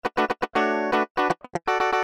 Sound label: Cutting